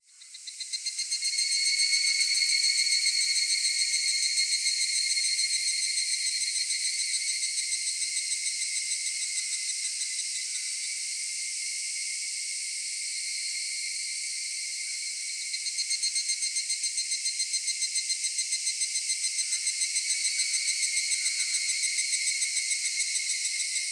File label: cicada cry; higurashi